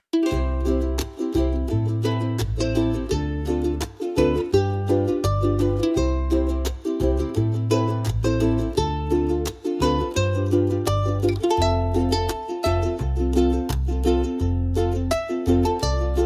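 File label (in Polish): Muzyka Hawajska; Mele Hawaii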